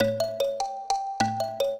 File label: trio, mbira; Mozart